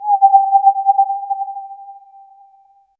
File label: a soft bloop, feedback